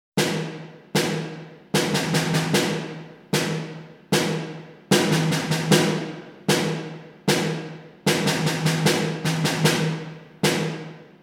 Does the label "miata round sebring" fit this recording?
No